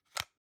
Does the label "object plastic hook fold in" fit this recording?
Yes